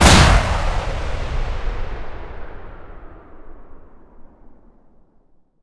boom

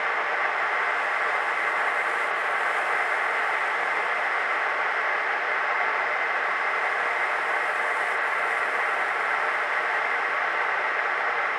ambience pipe

